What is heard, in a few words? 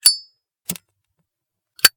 household cigarette lighter metal fliptop; sound effects one